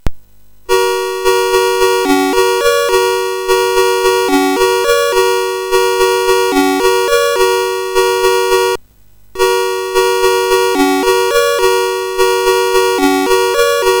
sid MOS6581R4, GAMES; Forest of Doom

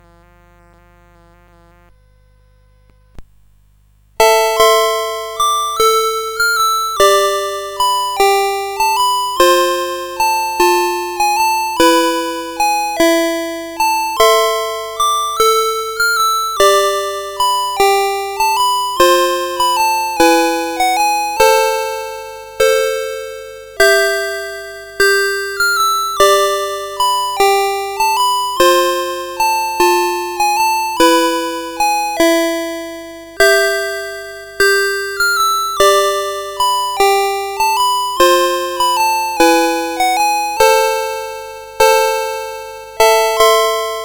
Greensleeves Traditional, CSG8580

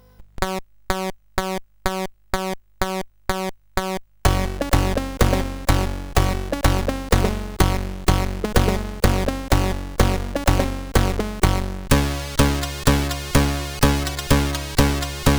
Remix sid CSG8580R5; Janosik

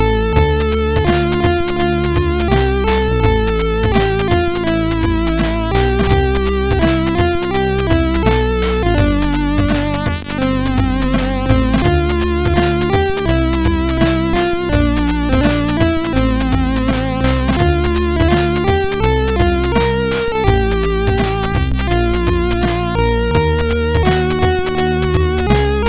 Theme sid MOS6581R4; Sweden; Shade

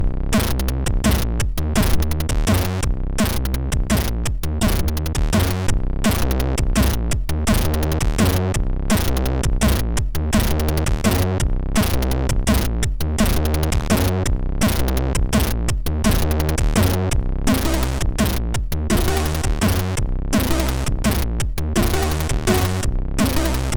DNB, MOS6581R3; LEK

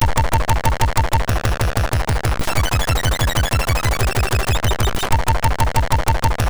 note, MOS6581R3

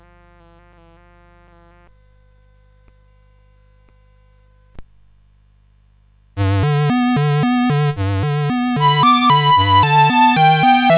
Song of India, CSG8580; CSG8580R5

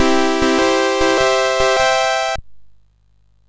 MOS6581R3, GAMES; Waterline

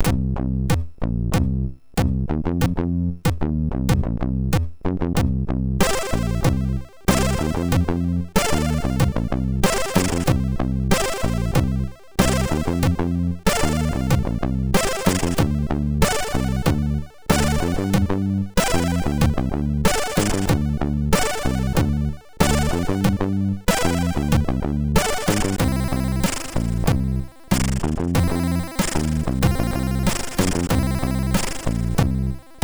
Slow Beat, sid MOS6581R4; X-Radical

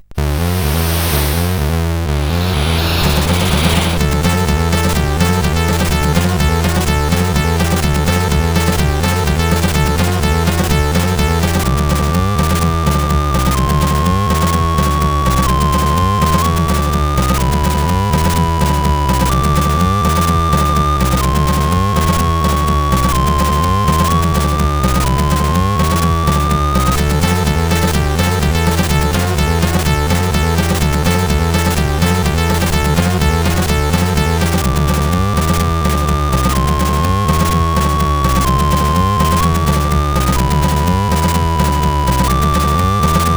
Shorty tune sid CSG8580R5

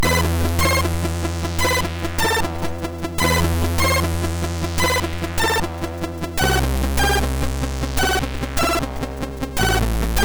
Soundbooster V1 mus5 remix, sid MOS6581R3

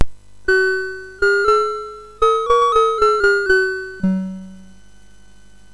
sid MOS6581R4, GAMES; Chinese Juggler

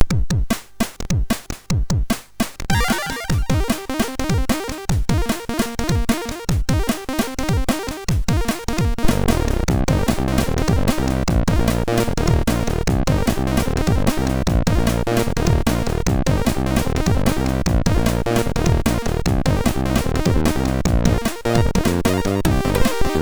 Master, MOS6581R3

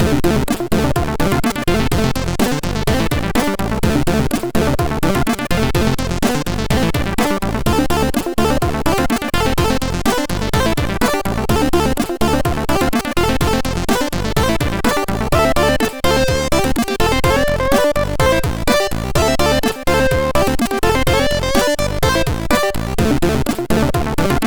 Sweeper, MOS6581R4

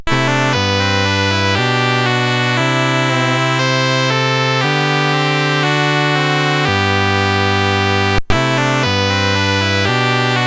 BASIC sid CSG8580R5

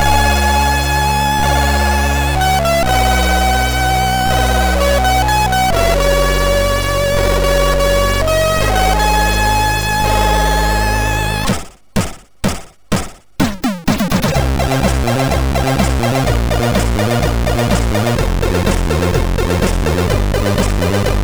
Intro Music, MOS6581R3

